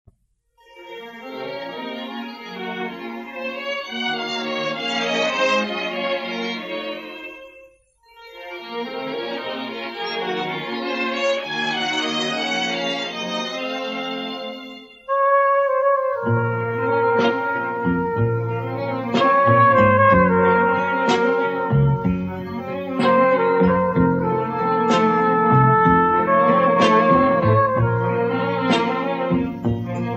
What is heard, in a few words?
melody